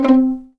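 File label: C4 pizzicato forte right, violins; East West; The Ultimate String Collection; VLNS PIZZ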